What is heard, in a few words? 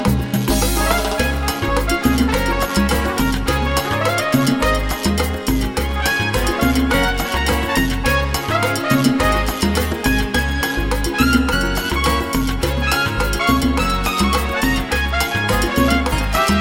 Classic Salsa